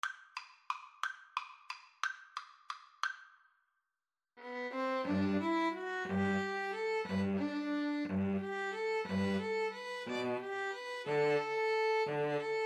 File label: serenade, 2 Violins Cello; tchaik